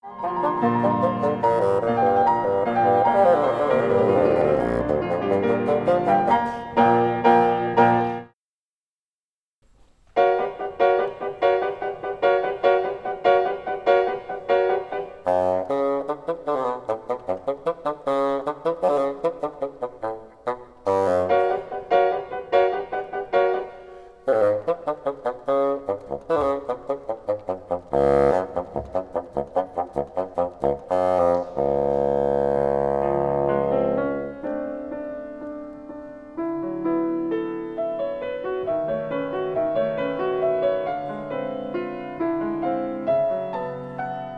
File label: microphone example instrument